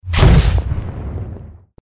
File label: destroy bomb